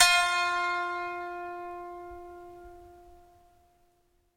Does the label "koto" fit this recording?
Yes